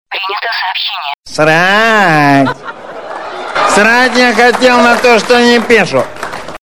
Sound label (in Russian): sms; prikolol